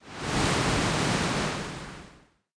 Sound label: Water